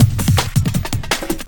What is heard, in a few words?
Heavy 162, breaks